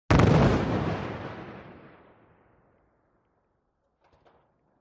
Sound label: 3er Car Blow Up 4 Pipe